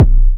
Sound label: Kick OneShot, Processed Percussion; One Shots; Sonic Foundry (Sony Creative Software); Rhythmicronics